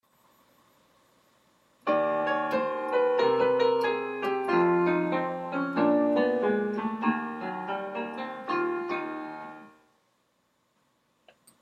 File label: bebop turnaround